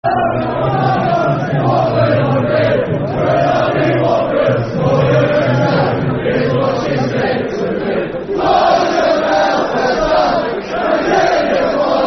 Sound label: When I Was Just A Little Boy, Football Chant; Watford Wfc